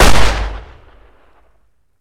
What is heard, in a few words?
Wpn that gun fire